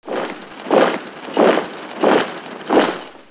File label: MARCH; MPG-Net (USA); garden